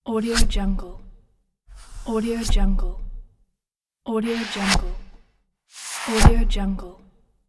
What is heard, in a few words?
fast swoosh